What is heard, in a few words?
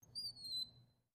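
Front Door Hinge Squeak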